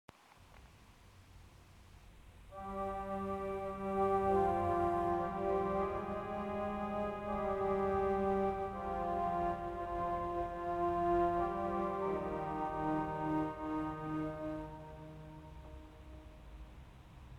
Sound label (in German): Vox Celestisreihe 8 4 Viola Celeste 8, orgel